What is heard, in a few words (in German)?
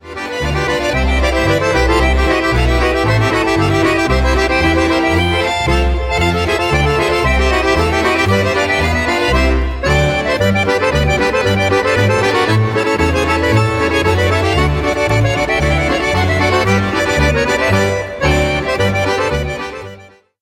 schottisch